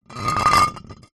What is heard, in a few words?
bottle wine drag movement pebblecrete; zapsplat